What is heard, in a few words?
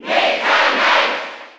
Cheer German; Meta Knight; SSB4